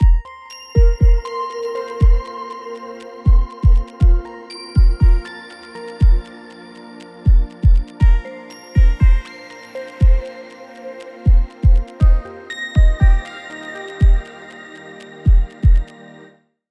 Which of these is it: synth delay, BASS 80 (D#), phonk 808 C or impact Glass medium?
synth delay